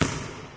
sports maximize; windows 9x; sound schemes

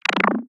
charge; rescue ranger; video games; source engine; tf; weapons

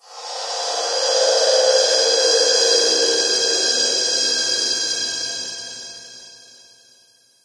amb rand abstract hi; source engine; portal2

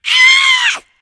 warn, voice; video game; source engine; left 4 dead 2; spitter

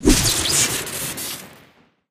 batsaber swing crit, weapons; tf

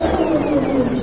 apc shutdown, vehicles; Source Engine; video game

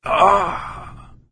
death, player

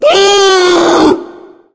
charger warn; player; voice; source engine; left 4 dead 2

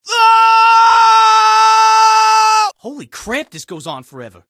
falling; scout; source engine; tf

